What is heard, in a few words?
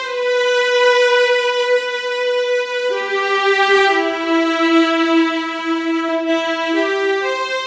Cello Line, диско хаус; сэмпл виолончели